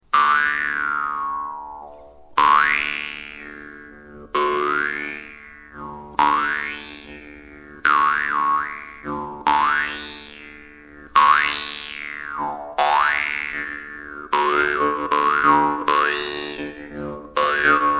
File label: russian vargan